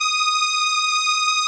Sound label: SOLINA, String Machines